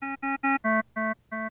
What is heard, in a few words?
CVS Caution, alarms